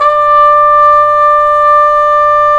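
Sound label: brass cup mute trumpet, dry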